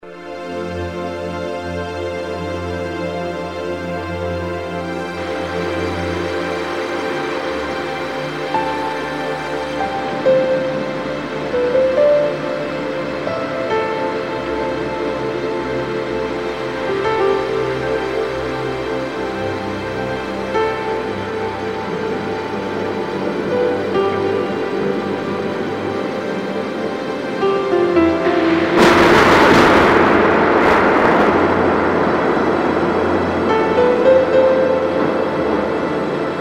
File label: thunderstorm